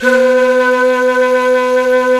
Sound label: Flute, Singin' Flute